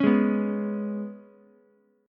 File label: Ab Minor Double Flat 5th Ab down Ukulele; strummed; JGuitar